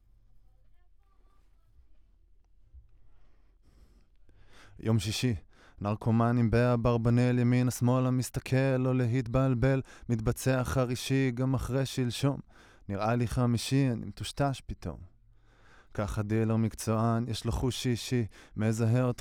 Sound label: Voc